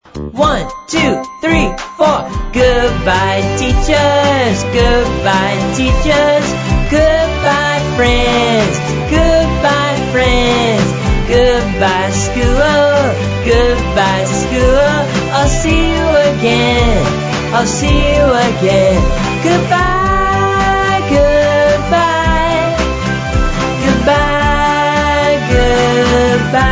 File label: Goodbye, School Song For Kids